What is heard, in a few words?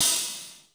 REAL OH; Crypton - DrumBase5000; R70 KIT #2